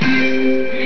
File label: buzz